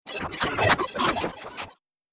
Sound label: A Laser Swooper; Laser Sound Sound Effects; Sci-Fi Laser Sound Effect